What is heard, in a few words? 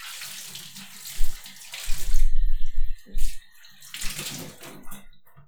Water